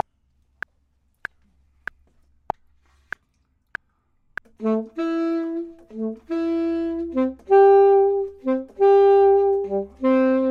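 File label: both, Saxophone and Clarinet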